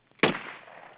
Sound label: rifle shot; sound effects